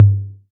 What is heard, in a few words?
low tom single hit g key, Royality free tom one shot tuned to the G note. Loudest frequency: 123Hz; tom drum samples